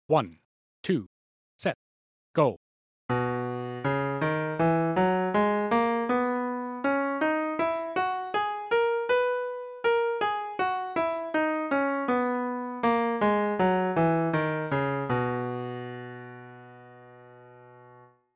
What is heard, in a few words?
Gb 1 octave 80bpm